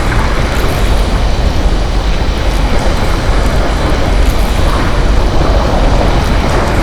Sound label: gore pipe; Quake 4 Alpha; id software